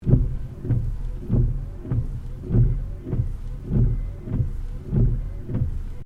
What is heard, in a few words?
Wipers Fast Interior Loop, car; Hyundai Accent 2008; Hyundai hire car; Vehicles